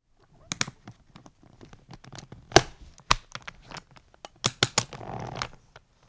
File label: Ski boots put on